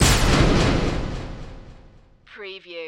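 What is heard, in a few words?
Production Element Hit Delay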